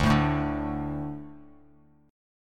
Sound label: D 5th D, down, Bass Standard